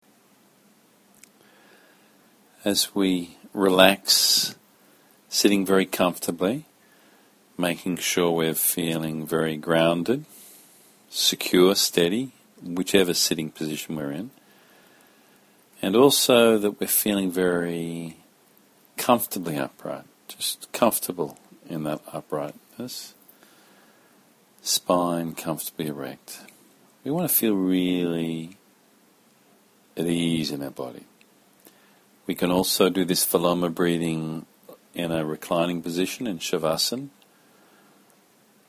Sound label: Viloma Breathing PRACTISE; Yoga Teacher Training; Breath and Mind Body Connection; Breath Practices